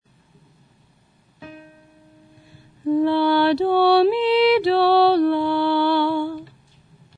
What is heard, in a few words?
minor triad la based